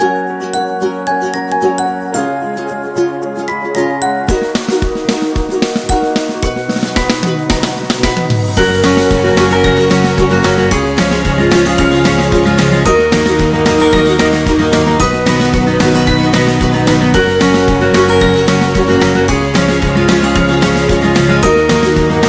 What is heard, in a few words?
Animation, happy music; happy music vol 8